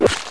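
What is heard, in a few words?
pick hit body; wanted; weapons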